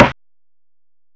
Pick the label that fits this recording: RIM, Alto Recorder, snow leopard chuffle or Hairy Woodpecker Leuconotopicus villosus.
RIM